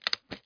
Sound klik; sony

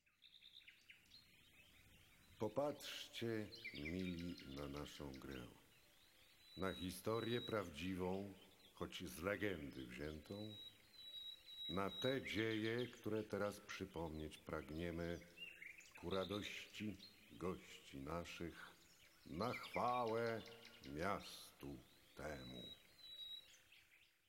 muzyka

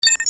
przewin; Puzzle

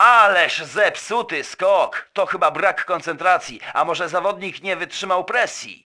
skok; wesole przedszkole bolka i lolka; olimpiada letnia

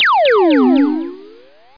parada

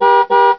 klakson; Puzzle

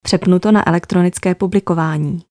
publik

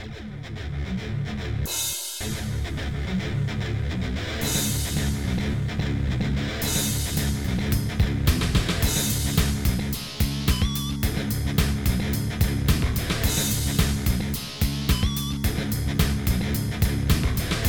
Utwor gitarowy; Satellite 2000

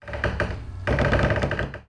skrzypienie; Szkola Koziolka

